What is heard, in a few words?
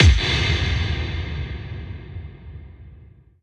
Boom FX, 140 BPM; Pitched; Vengeance Samples; Vocal-Hook Kits; Song Kit; Break The Walls